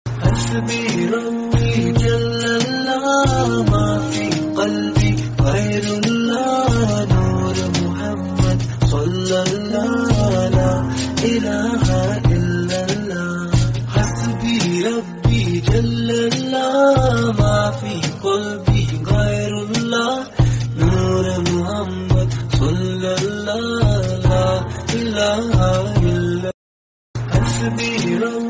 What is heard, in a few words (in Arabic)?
hasbi rabbi jallallah arabic